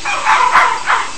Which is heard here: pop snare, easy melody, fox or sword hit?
fox